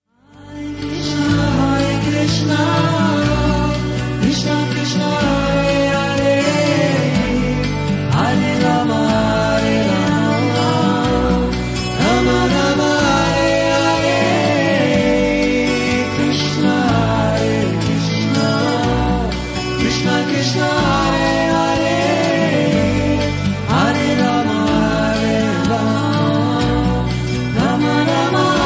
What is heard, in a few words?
hare krishna mantra; krishna ringtone